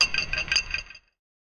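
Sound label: game vfx sound for grappling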